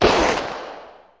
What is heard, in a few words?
gun shot; Crash Bang Boom